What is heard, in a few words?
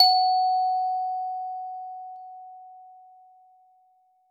high quality f minor scale, kalimba